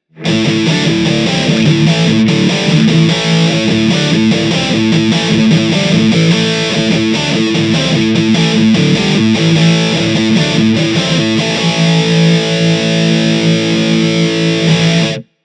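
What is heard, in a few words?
blackstar ht metal 60 overdrive 2 british shure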